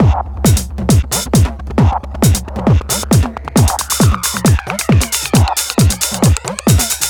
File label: House; Best Service ProSamples vol.10 - House; AKAI